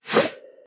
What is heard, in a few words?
Crate Spawn